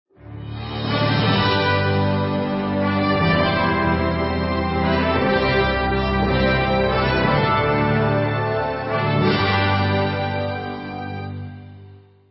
Medal Ceremony short

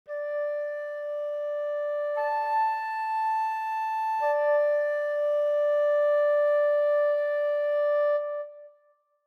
fluit

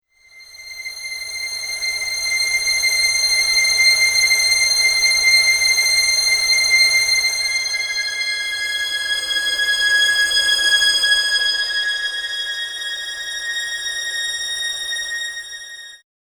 violins

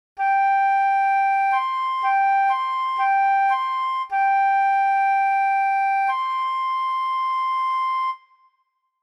dudochka

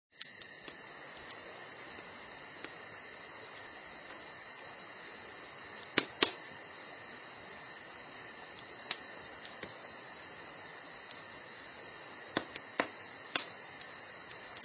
Night Camp

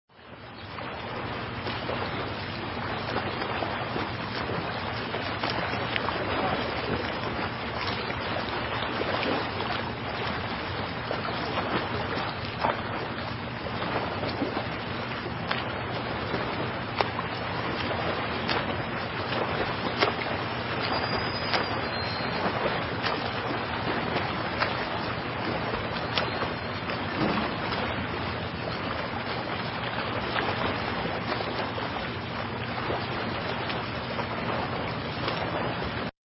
Beach Sound Effect

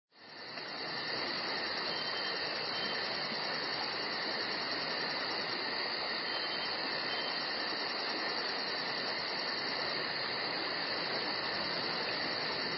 Forest Evening

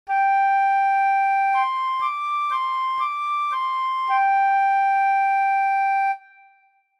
dudochka

